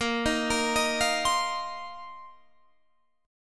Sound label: F 7th Suspended 4th, A sharp